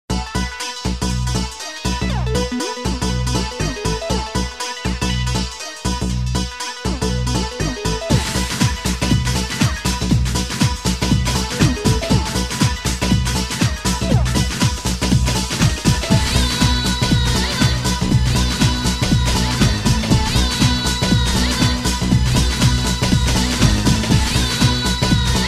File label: ethnic trance